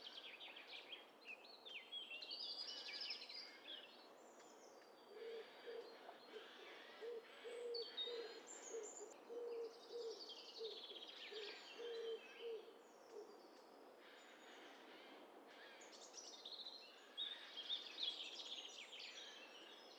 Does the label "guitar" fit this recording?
No